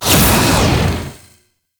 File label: Tear Dissolve; Entrati; Swarm